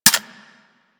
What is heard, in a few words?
M16 Mech, rifle; Wraith